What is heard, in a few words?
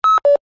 technology CB radio two way beep